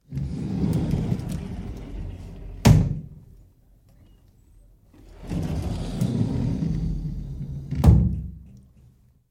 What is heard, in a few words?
doors; bbc; Running Late Game